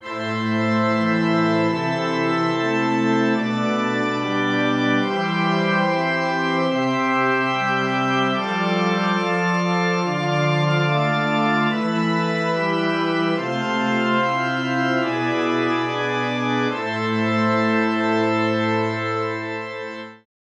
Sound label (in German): Stimmiger Contrapunkt; Ronge